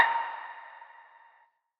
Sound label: tonal blip; soundscrate